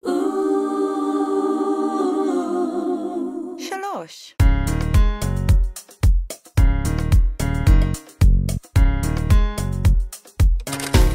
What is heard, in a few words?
I Am So Relaxed